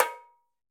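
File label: Tamborim Open; Latin Percussion; Drums; Drum Hits; Tambourin; Ableton; Factory Packs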